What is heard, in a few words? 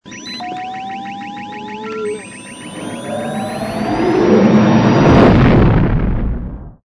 Jump to lightspeed